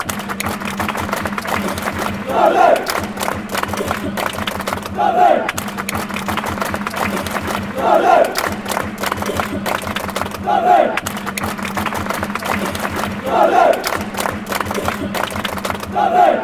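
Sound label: fanchants, football chants; dundee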